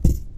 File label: ceiling tile step, physics plaster